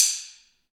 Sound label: Ambient Stix, Afro Wood; Roland LCDP14 Africa VOL-2